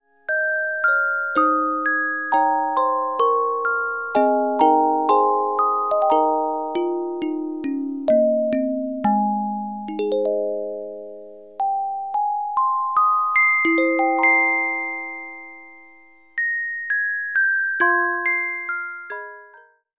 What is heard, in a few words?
Carillon Music Box Melodies